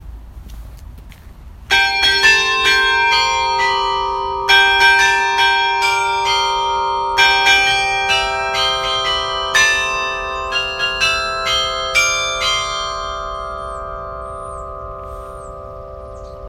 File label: Happy birthday to you